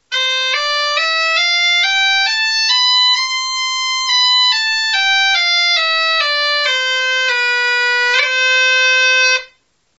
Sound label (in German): punteira tonleiter